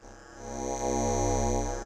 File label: Ring Mod